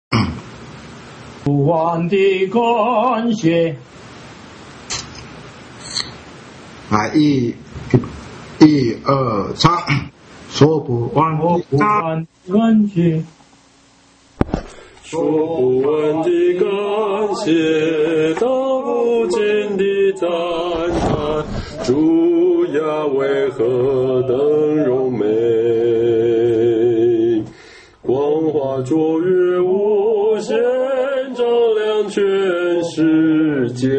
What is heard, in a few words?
hymn; worship